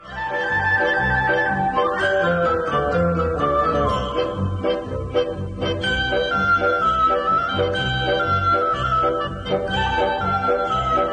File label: Instrumental